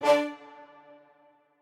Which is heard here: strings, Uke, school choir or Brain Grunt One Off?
strings